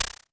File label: polyvox perc, Polivoks; Formanta Radio Plant